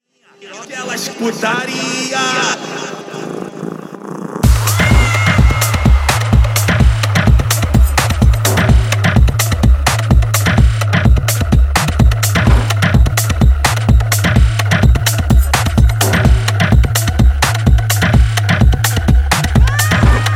Cats Love Drums; Shall Not Fade

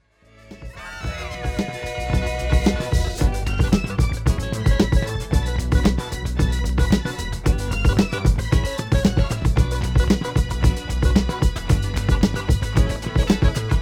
the disco scene (pt 2 instrumental)

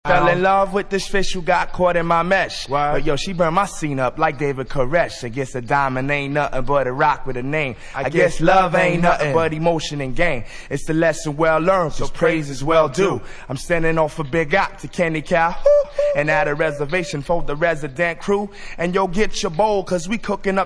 acapella

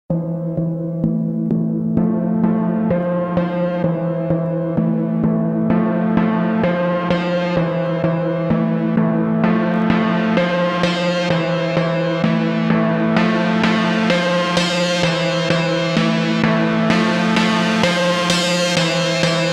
Arpeggio

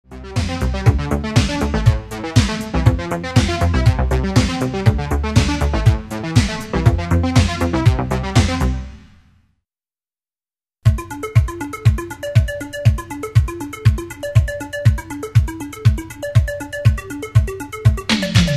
firelight instrumental; disco

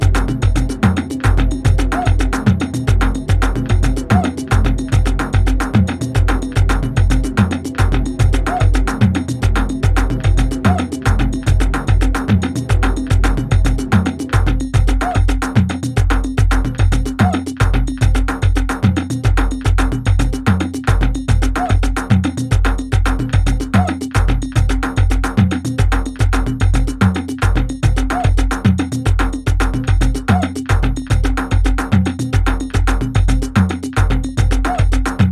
Polyrhythmic

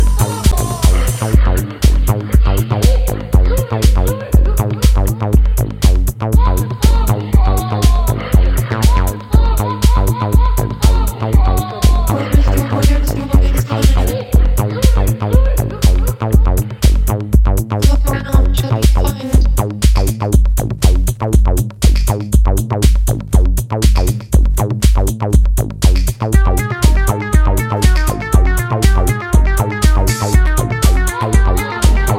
Bass Bam

